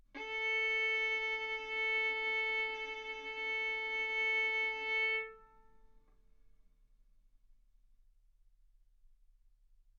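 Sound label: Violin arco mf A string A4 B4 stereo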